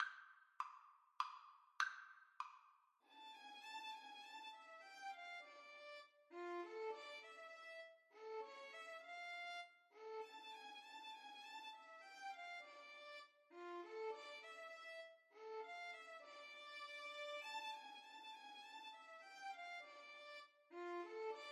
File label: Violin Duet